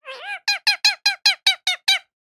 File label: birds cuit cuit